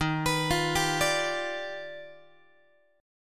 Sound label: D sharp Augmented Major 9th D sharp Guitar; arpeggiated; JGuitar